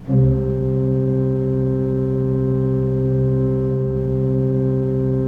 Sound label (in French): Orgue Eglise Doux C3, Pipe Organ Soft